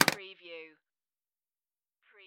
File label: Pinball sound, clank with recoil